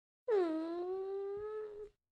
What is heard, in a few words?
Cheer; slotssister